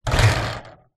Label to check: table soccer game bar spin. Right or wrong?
Right